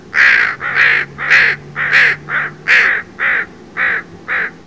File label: two crows; animals